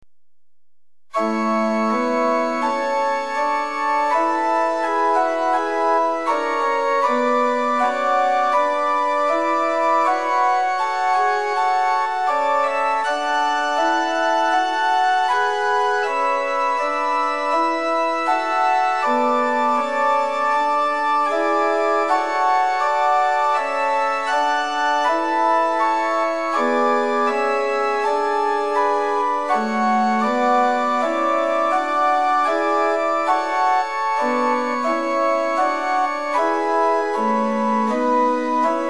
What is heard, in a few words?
Liturgical Organ Pitch 440 Temperament Equal